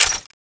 mk46 bullet in, weapons

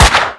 usp shot

